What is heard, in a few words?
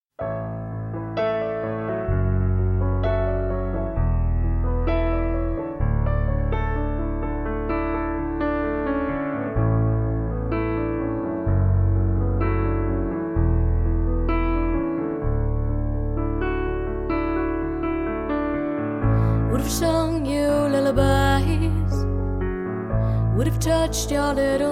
Lullaby